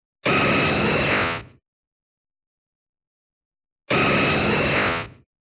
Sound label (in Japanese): ファイヤー